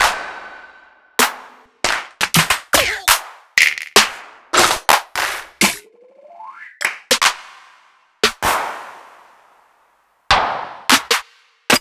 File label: 808 trap Claps; Sliced